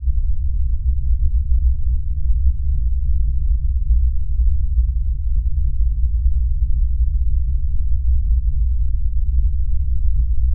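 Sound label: spaceship hum; spacecraft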